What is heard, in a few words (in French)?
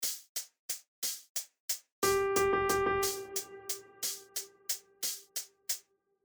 Croche 4 Double croches Ternaire